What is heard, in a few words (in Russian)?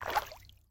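вода мягкий короткий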